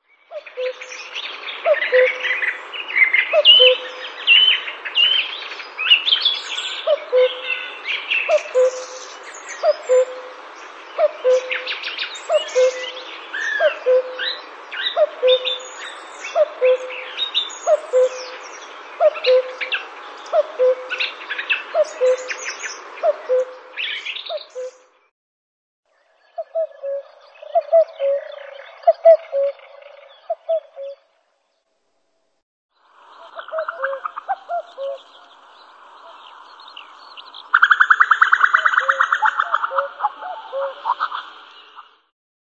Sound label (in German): Stimme, Vogelstimmen